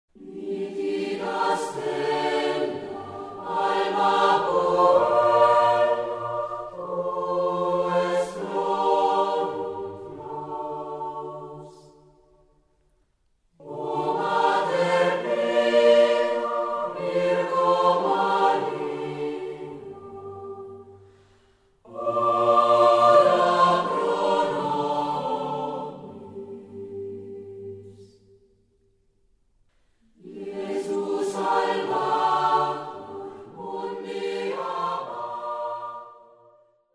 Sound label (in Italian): Tutti; Nitida Stella